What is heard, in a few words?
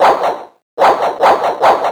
Stamp; BOMBERGIRL RAINBOW; Arcade